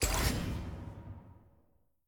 ui explore button open; spirit blossom

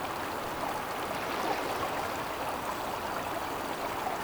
Amb Creek; bloom minigame

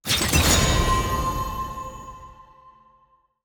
UI Event Rank Up; bloom minigame

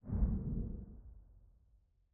Chomper Enter; Puzzle

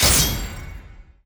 Enemy Attack Spin Hit; bloom minigame

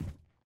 library puzzle book roll

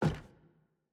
Footstep Basement Wood Stairs

